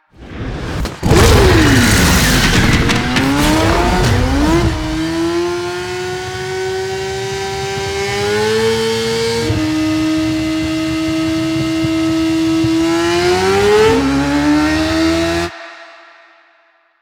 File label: Motorcycle Mount; Warframe; Nineteen Ninety Nine; Quests; Mall Intro